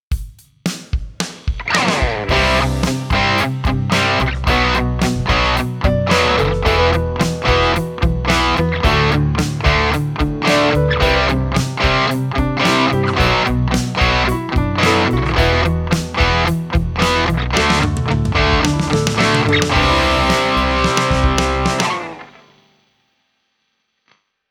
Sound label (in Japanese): ローカット; boss gx1